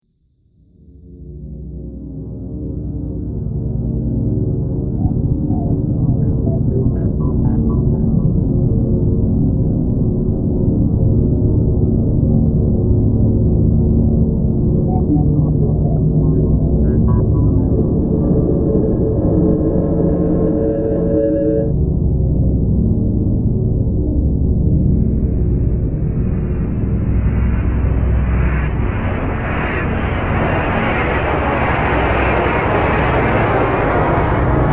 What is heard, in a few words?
Drone Future Interference; Drone Sound Effects